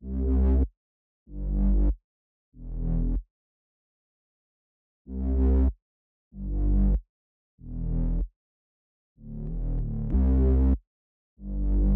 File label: 95 BPM G Maj Bass; Loop Stems; Cymatics; Menace